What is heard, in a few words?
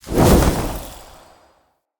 Casting Device Cast Dual; Weapons; Player; Casting Devices; Galene